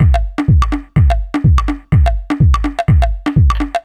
C# 125bpm, House Drumloops; 90 s Sample CDs; Zero-G House Fabrik Drumloops; ZG Drumloops

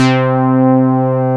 MOOG, Synth Bass, Moog Basses

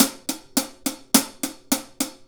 Hi Hat Pattern

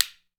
WOOD SPOONS; Jew's Harp & Spoons

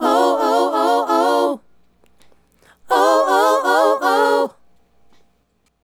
Female Group, Hip-Hop and RnB Vocals

